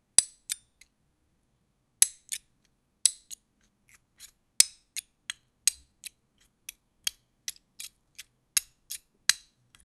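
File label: sound knife cut fruit slow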